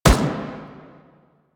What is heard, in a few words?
Turret First Shot; APC; Warframe; Lasrian